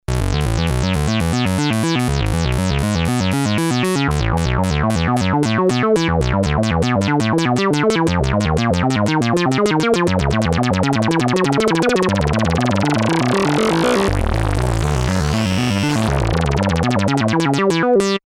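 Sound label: rez, Compact analog monophonic synthesizer; resonance above; MFB nanozwerg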